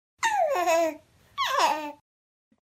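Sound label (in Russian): реакция на родителей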